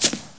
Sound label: step, footstep grass; SINDEMO